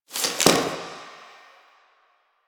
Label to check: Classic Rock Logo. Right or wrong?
Wrong